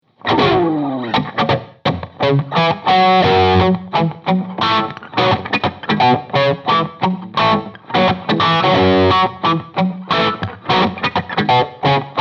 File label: Black Spirit 200 Combo Crunch; Hughes & Kettner